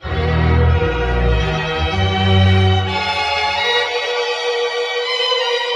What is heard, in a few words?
strings; Sad